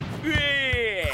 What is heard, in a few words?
yaaay; kuroo